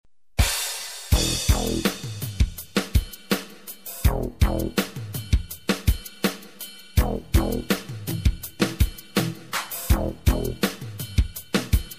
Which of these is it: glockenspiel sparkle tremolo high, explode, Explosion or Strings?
Strings